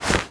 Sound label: Tenno Alt Holster Weapon, Foley; Warframe